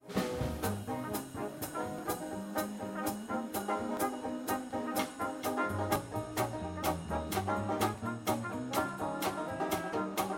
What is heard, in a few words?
Solo